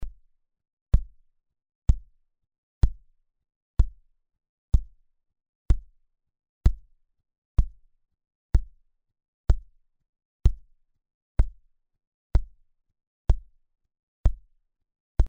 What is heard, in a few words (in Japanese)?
キック